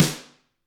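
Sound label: GRETSCH snare, Drums; ILIO; Double Platinum Drums 1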